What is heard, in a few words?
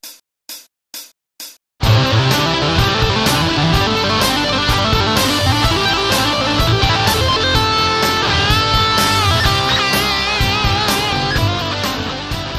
tap, guitar